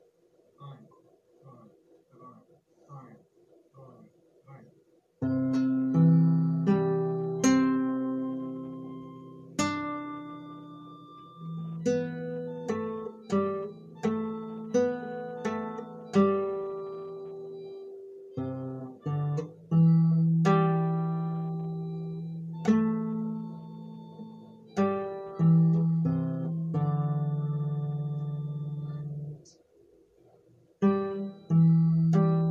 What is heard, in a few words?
guitar